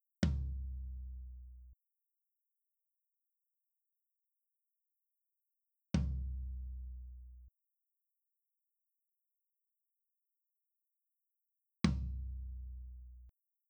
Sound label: Drums Tom